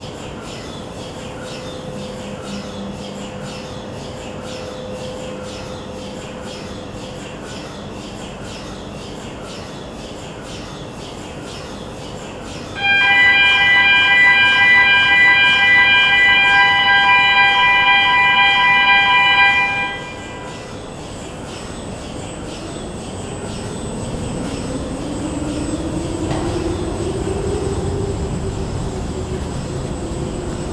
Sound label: paranal ut2 dome rotation; soundscape